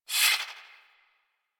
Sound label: Swing, Melee; Balance Two; Warframe; Weapons; Modular Melee